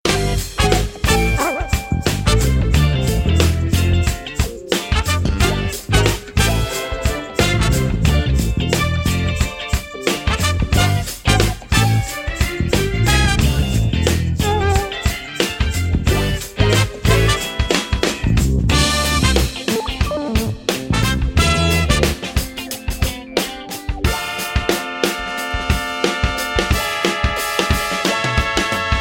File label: funky soul